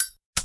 zippo open; civ13; civ14; Items; Lighters

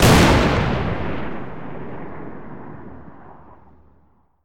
explosion; Weapons; Explosives; Explosions

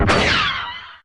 blaster rebel, Guns Fire; Weapons

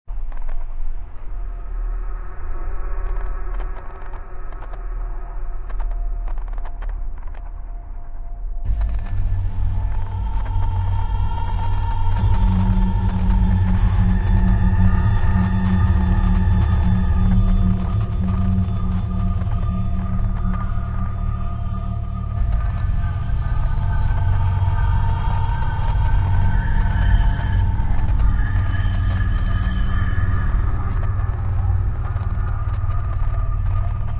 ambience tech

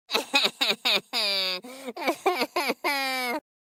scream droid; Civ; Voices; Screams

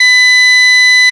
confirm beep

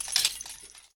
lmg bolt open; Weapons; Guns

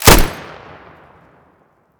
Vickers, Guns Fire

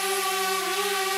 drone active, Engine; Vehicles

